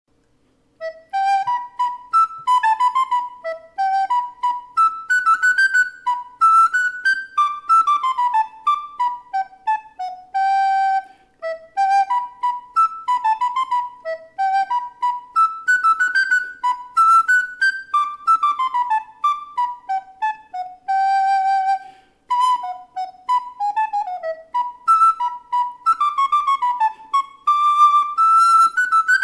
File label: zamra descant, recorders